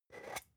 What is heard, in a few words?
spice jar scratch tonal open slow